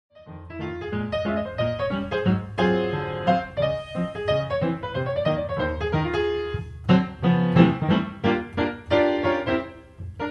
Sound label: Solo